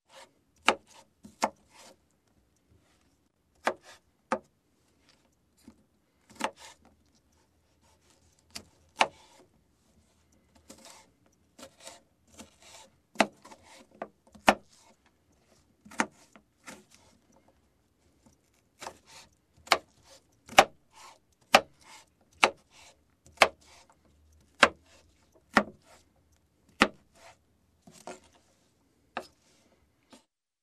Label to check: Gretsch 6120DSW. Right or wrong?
Wrong